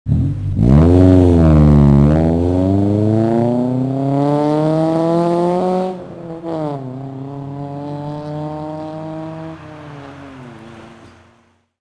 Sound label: Civic with titanium exhaust pulling away; Fastcar Magazine; Invo Auto